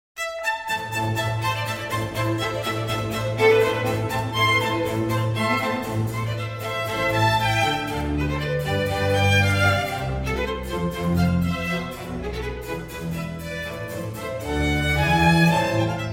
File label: Concerto For 2 Violins in A Minor Allegro Molto